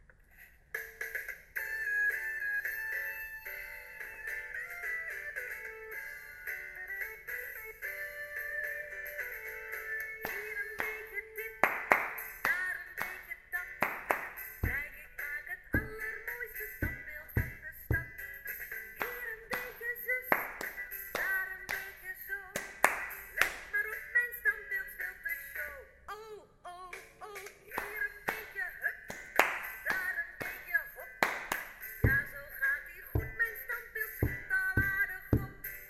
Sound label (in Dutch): opname instrument; muziek onze stad groep 3 4